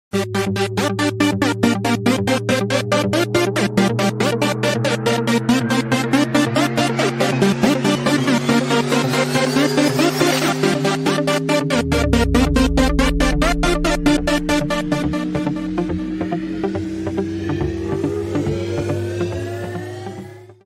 Rollercoaster Synth; The Surge; Walk In The Park DLC

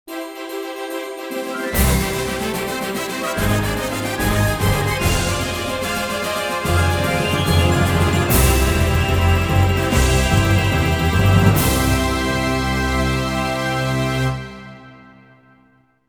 All Clear; Terra Cresta 3D; Nichibutsu; Saturn

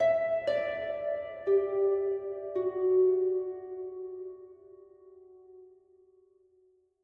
Harp High; gamerip; that dragon cancer

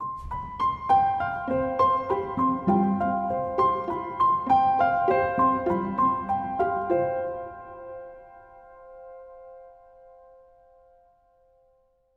rainforest bgm head 100bpm 12beats CMajFMaj

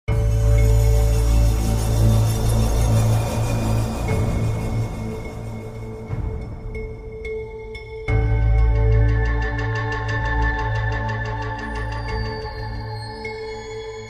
Cave Battle Music; ARK Survival Evolved

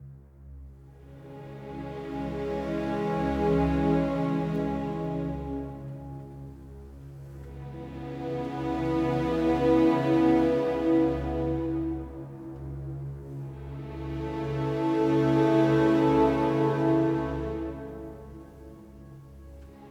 Default Loading Music; Battlefield 1

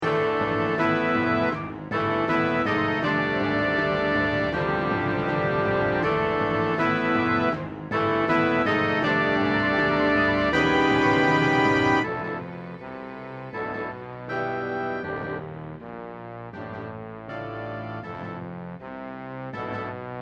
Battle; SimpleRPG; Android

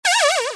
Armor scared; gamerip; Robot Wants Puppy; Blocko

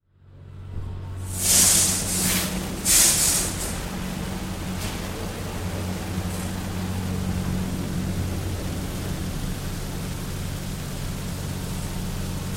Carwash Run

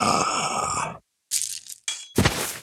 Anubis Death; Puzzle Chronicles; PS3; PSP; X360

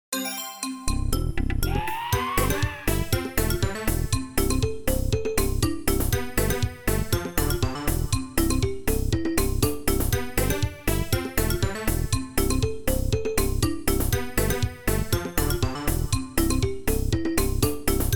Bonus Level; Gold Miner Joe; ios macos windows

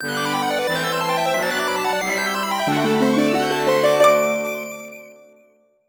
Fanfare Sword; The Legend of Zelda: Link's Awakening

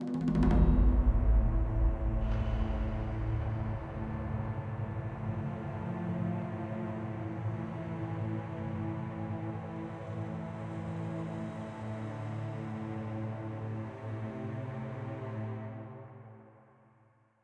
Spotted Stinger; Macau Fort Yudayong

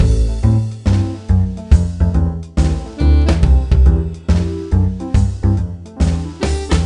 music loop; CSI: Crime Scene Investigation Deadly Intent The Hidden Cases; NDS gamerip

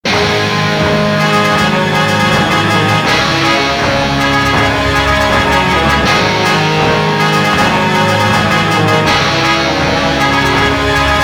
Victory (Win); Skorost; Speed Racer The Videogame; PS2; Wii

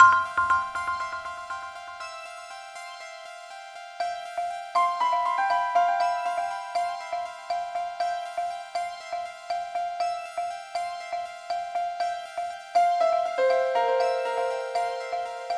Phone Melody; Amiibo; Animal Crossing Happy Home Designer

